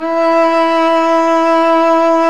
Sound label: STR ARCBSS5Q, Roland LA Composer strings; Roland - LA Composer vol. 1